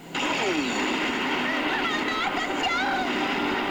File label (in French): Sacha sous les flammes; Episode 74